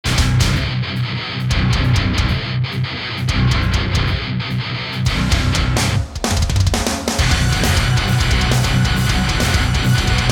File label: Triaxis Lead full band stereo mix